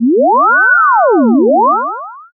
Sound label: police siren